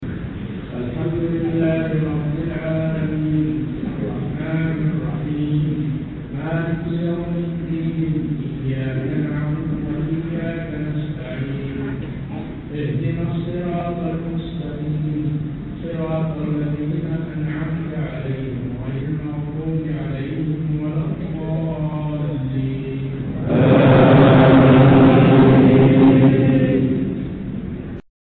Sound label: quran